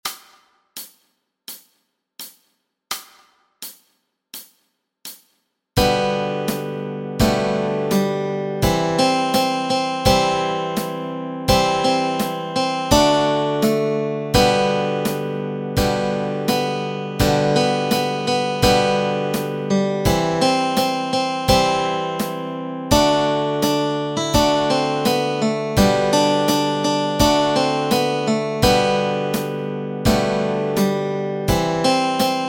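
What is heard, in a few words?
Melody and Chords Track, Easy Guitar and Ukulele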